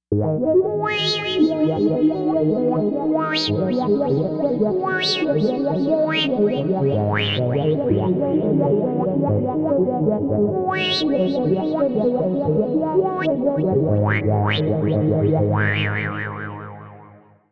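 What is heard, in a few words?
Warble Lead Minimoog; Soniccouture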